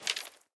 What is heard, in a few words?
armor; god artemis; smite; artemis v3 skin15